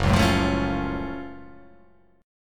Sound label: F sharp Minor Major 7th F sharp